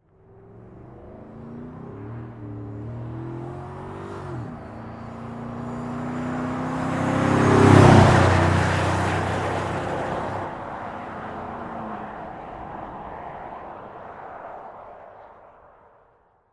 Truck Pass By, Transportation, Automobile, Truck, Pass, By, Fast, Chevy, Silverado; BlastWave